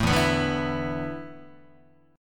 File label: E 7th Suspended 4th, Guitar; E7sus4 strummed; JGuitar